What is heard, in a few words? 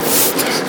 PRINTING PRE, industrial; Greytsounds; INDUSTRIALFX